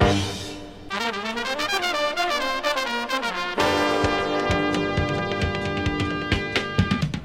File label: horns salsa, Loops, Phonky